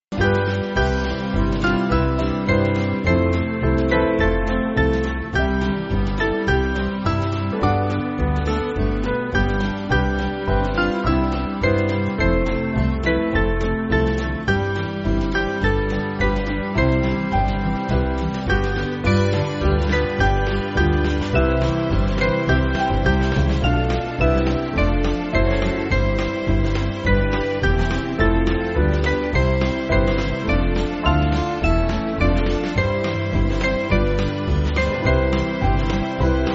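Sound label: Band, Backing Files for Churches; O My Merciful Father; Sankey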